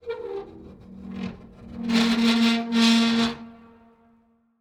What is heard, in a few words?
door metal big metal gate open close mono; Sonniss GDC